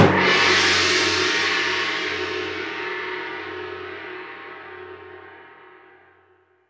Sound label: GONG, cartoons